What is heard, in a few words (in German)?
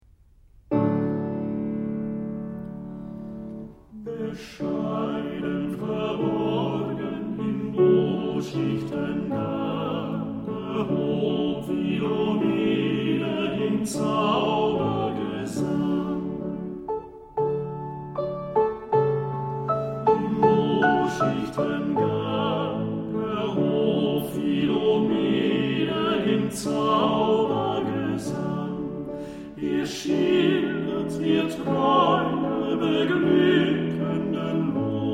Die Nachtigall; Schubert Vol.1